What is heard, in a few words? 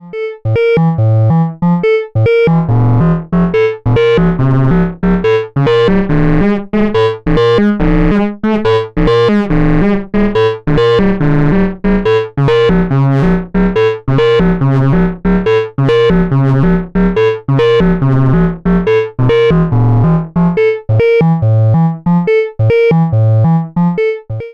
Korg volca modular MOD